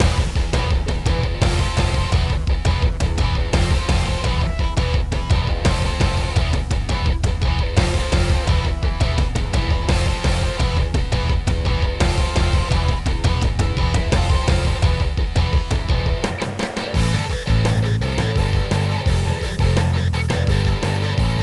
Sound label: Music; 4x4 EVO2; Evo1